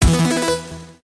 stop bonus; All Ways Joker

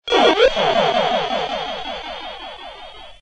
win mini pay; games; poker; American Poker The Legends II

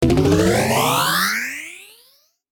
win start, keno; games; Bingo

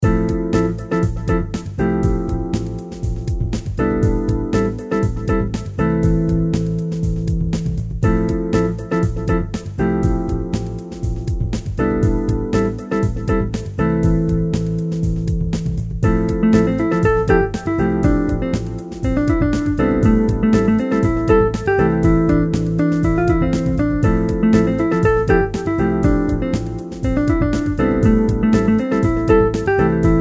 reelspin fg; Stocked Bar

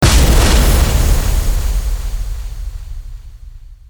count start; Gem Star

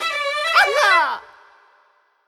gambler win; Fruit Fiesta; Wazdan

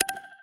service click; Wet And Juicy

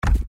trex reelspin click; The King Of Dinosaurs

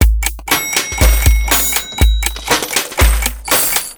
WAIT USER CHOICE; Two Sevens

